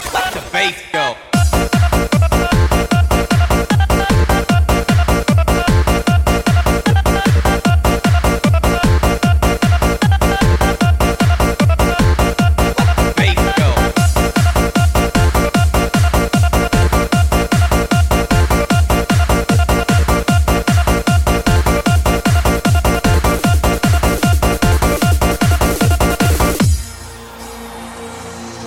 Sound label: Let the Bass Go